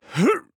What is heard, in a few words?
Grunt Jump; Knight